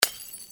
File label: BOTTLE BREAK